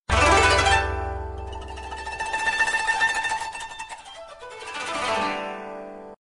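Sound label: Japanese melody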